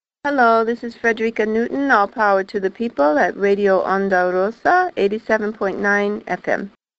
jingle; Ondarossa

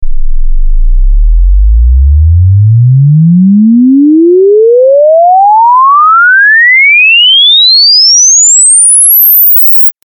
Sweep 16 Hz - 22000 kHz (for Filter Testing)

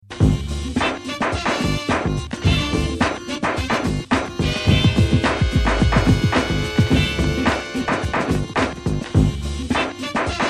beat sketch

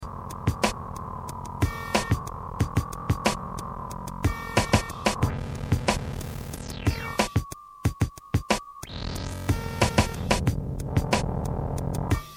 beat

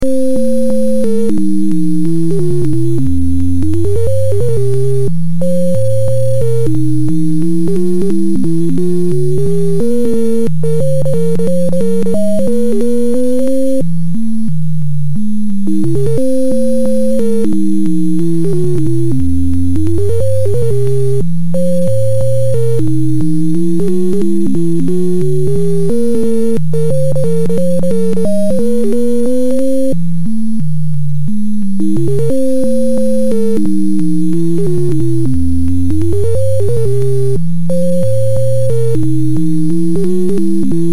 blues; zookeeper

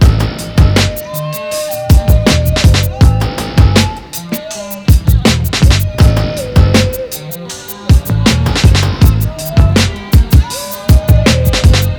shadows loop

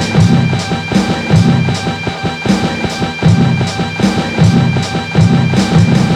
loop

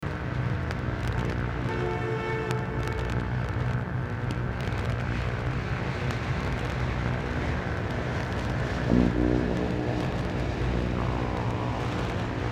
city noises

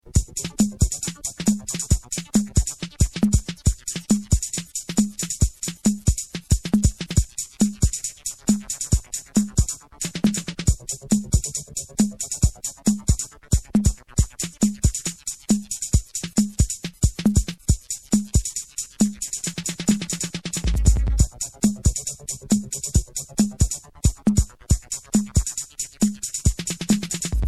electro beat

